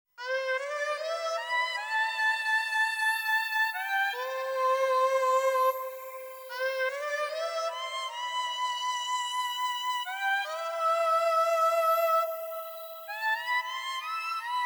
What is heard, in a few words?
grass flute